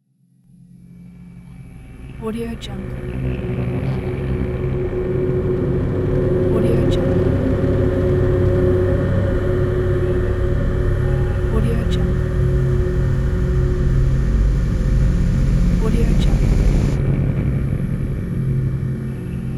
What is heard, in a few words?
rising horror soundscape; applehillstudios